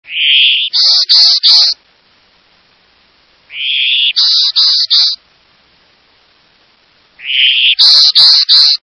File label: Golden winged Warbler